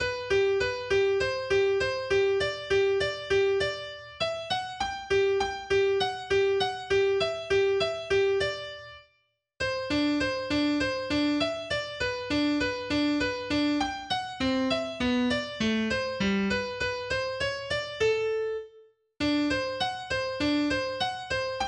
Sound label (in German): Andantino in G Dur Solo AkGit; Melodie-Solos; Carulli